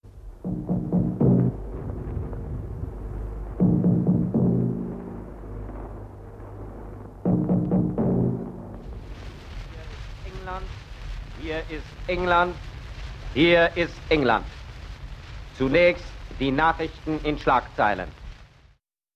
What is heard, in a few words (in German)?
BBC Erkennungsmelodie